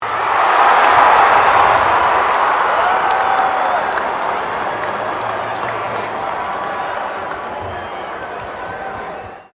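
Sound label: crowd 15K Rugby World Cup cheer high, Rugby world cup game; Crowd Sound Effects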